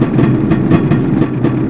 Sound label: MINECART; REDNECK; RAMPAGER